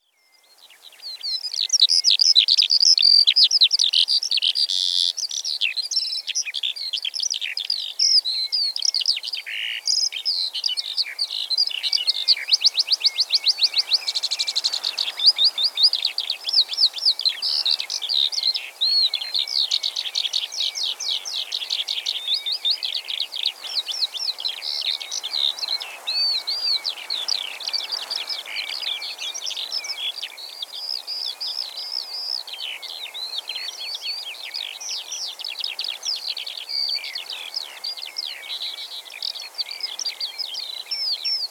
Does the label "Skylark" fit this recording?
Yes